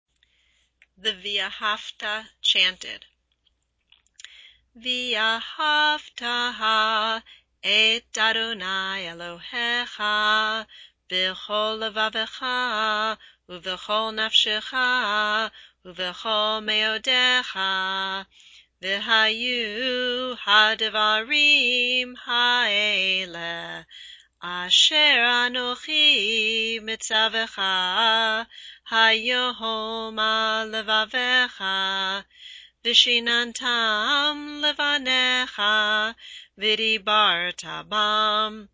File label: vahavta chanted